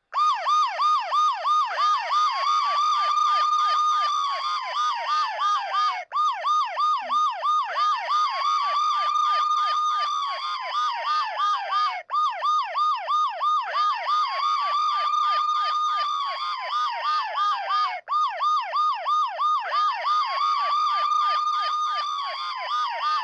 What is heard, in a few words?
siren